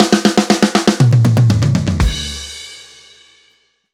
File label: Fill 120bpm